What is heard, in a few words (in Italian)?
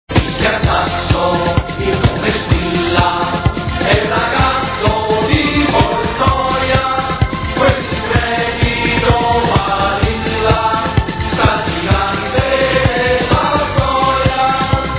balilla techno; italia rsi; canti italia